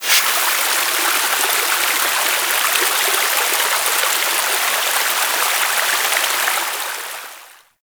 Water Fountain Burst; Ambience; Props; Warframe; Nineteen Ninety Nine

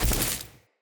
Walk Vegetation, Footsteps; Enemy; Characters; Lady Deora

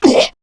Pain, Male

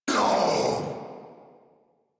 Death Corrupted Grineer Male

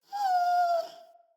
Wolf Cub Trapped Call Close; Animals; Wolf Cubs; Orengal Wolf Cub A

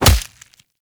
Melee Fist Impact Flesh; Fists

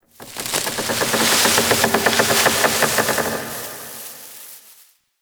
Exterior Tree Wood Creaks Angry; Ambience; Props; Warframe; Duviri

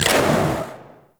Grenade Throw; Warframe; Grineer

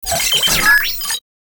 Sniper Drone Vocal; Lasrian Drones

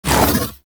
Ice Weapon Beam Retract; Warframe; Corpus; Corpus Railjack; Spec Ops Ice Moa

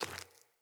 Sandals Walk Rock, footsteps

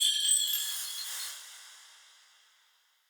Mote Chatter Bell First; Motes

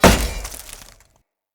Great Sword Melee Impact Wood; Weapon Impacts; Player; Swords; Environment; Founders Great Sword

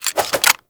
Pistol Reload, Weapons; Warframe; Grineer; Kohm

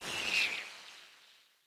Bow Corrupted Arrow By; Weapons; Enemy; Bows; Hunter Disguised Bow; Dendrit

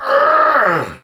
Pain; Warframe; Tau; Dax; Ashigaru

